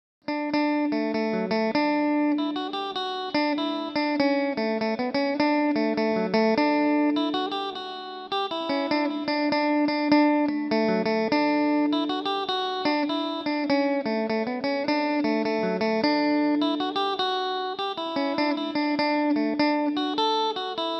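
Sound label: D major jig, tenorcaster; Kitty McGee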